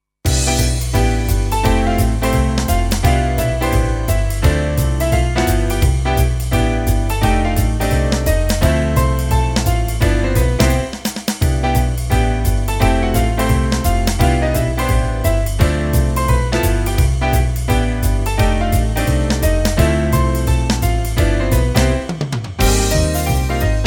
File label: Bebop, clarinet